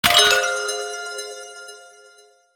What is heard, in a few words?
Christmas game menu ui unlock item